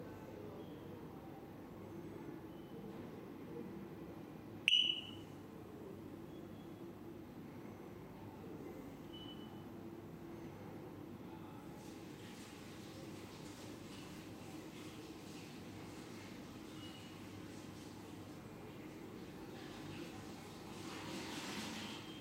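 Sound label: Fault signal sound, smoke detector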